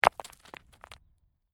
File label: Rock Fall And Tumble Onto Rocks; A small stone or rock falls on other rocks. Rock impact and settle